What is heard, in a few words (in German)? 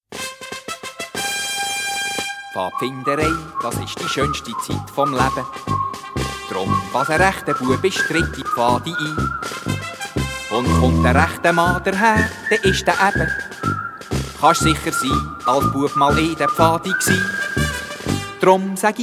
Jingle; Pfadigeschichte